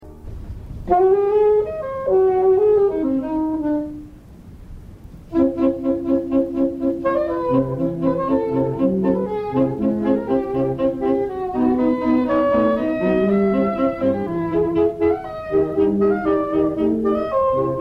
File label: Saxophones, orchestral